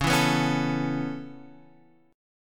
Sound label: Db Minor 7th Sharp 5th Db down Guitar Drop D